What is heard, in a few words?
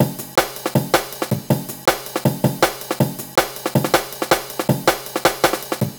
160 Sunshine Breaks Drums